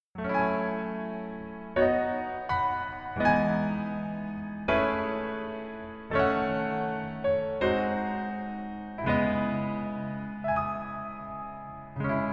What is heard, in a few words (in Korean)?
Piano ver, 멜로디MR; 낭만닥터 김사부